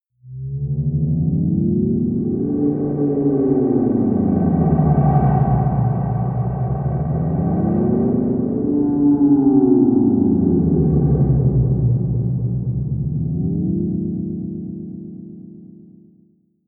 swaying drone deep, drones; musikelemente

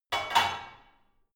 barbell only replace unsteady

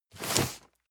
cardboard box medium slide hard; objekte

